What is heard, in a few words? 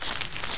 shock walk; svencoop